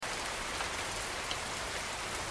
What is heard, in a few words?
patter; ns stormsiege